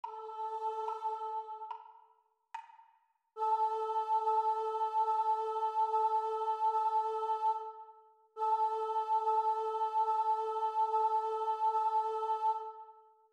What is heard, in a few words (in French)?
Chant